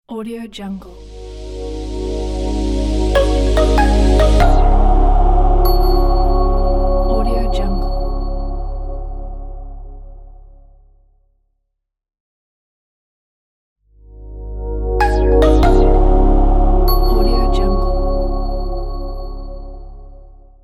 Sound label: modern cool logo